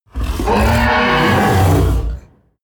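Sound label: coloss shout angry deep, creatures; kreaturen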